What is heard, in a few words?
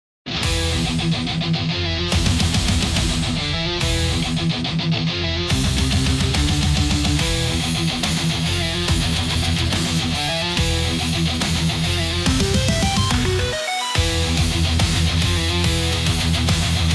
hard rock